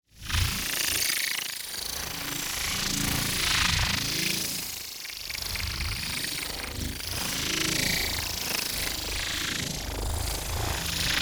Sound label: MOVEMENT Nano Swarm; NEON; boom library